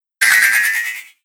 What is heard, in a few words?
Space Zoom, whoosh; sound design